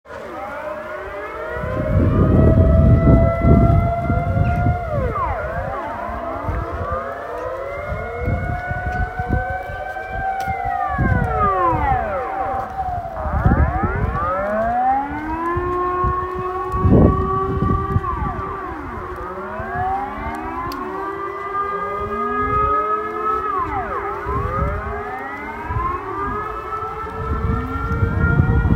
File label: houten alarm test